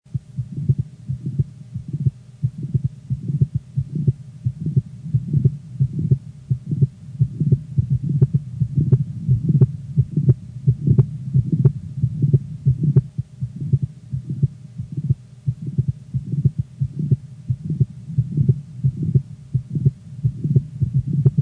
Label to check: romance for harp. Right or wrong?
Wrong